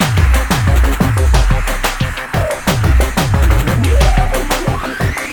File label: loop